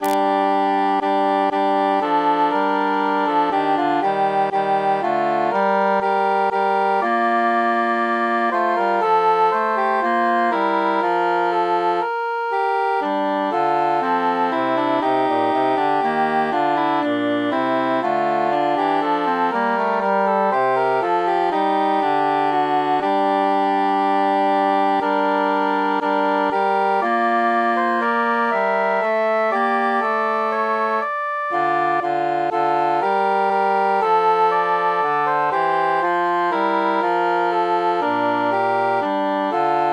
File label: tenor mute